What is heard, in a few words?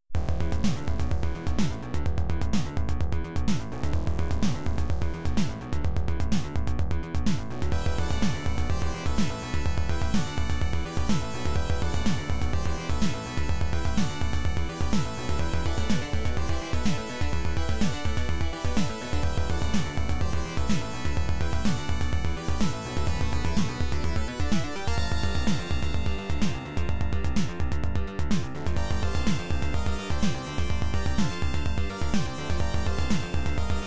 Multiball & Countdown Bonus; Lights...Camera...Action!; Gottlieb; pinball